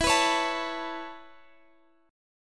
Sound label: Db Minor 7th Sharp 5th E